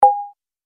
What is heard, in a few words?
Multimedia Rollover Click